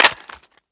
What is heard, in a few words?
hit, m16a4